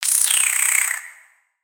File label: Spider Idle Look; creatures mechanical; Lego